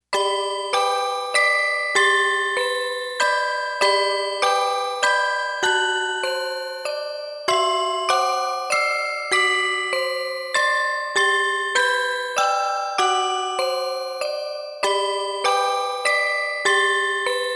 Comedy Style; Sound Ideas - Comedy Styles

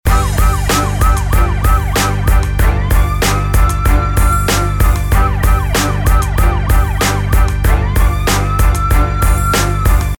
Mus Pop Hip Hop

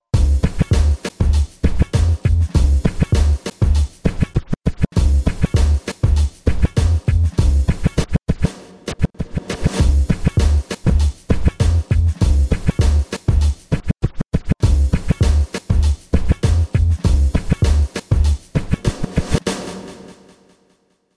bed bumpin

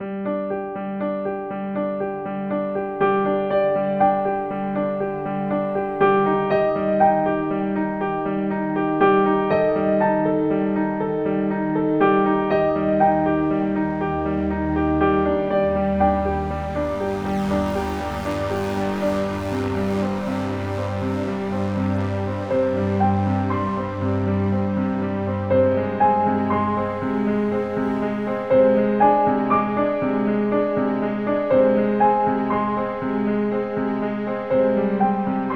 Worry, piano medly

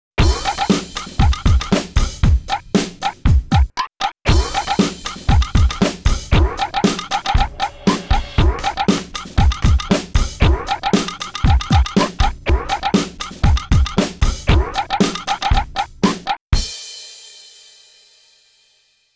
bouncy bed

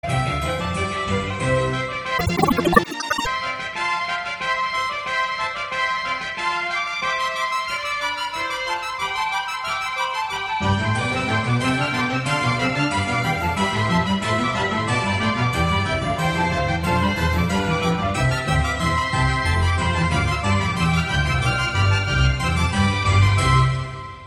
Easy Listening & Classical; Sound Ideas

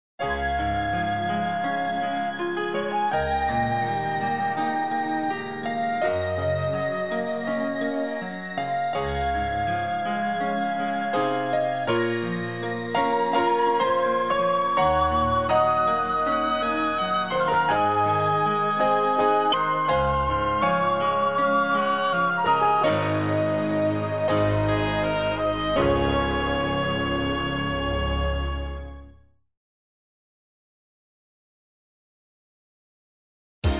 Easy Listening & Classical; Sound Ideas

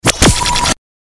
Galery Noise; Sound Ideas - Galery Noise 001

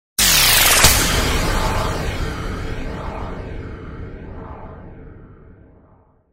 Galery Noise; Sound Ideas - Galery Noise 002

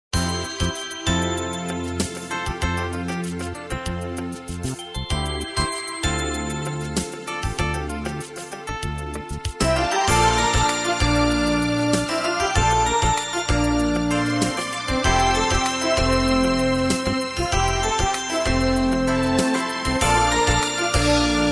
Easy Listening & Classical; Sound Ideas

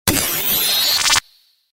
Galery Noise; Sound Ideas - Galery Noise 002

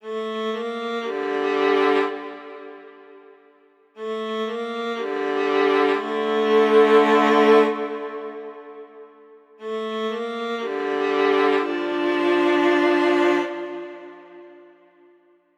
Violin medly, ambiguous emotion

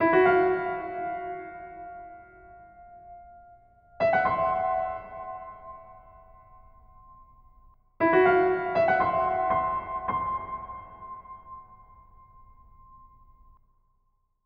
Surprised, piano medly

